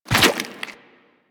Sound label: Land Goo, Footsteps; Warframe; Tenno